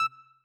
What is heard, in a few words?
beep button; 18 button and menu sounds